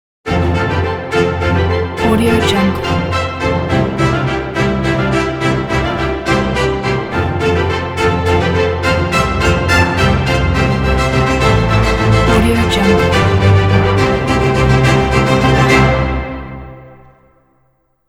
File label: fanfare logo